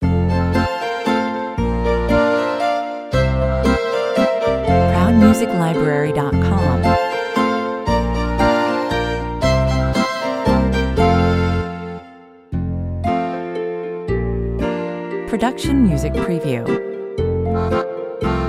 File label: happy people happy cows 115 bpm; Happy cows, happy farmers - everything will be fine - the original